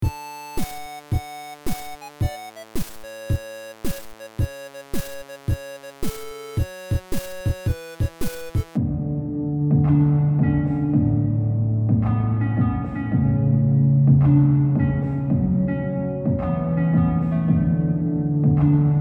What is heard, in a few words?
lost kitten lofi remix